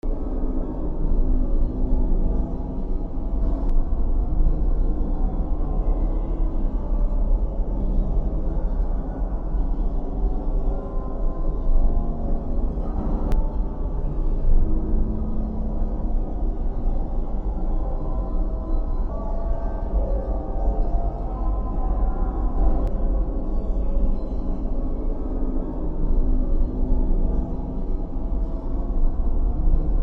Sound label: dark fluid slow drone